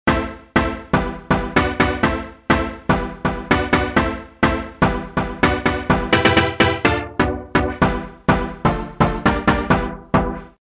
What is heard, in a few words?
Eurostab; Roland M-DC1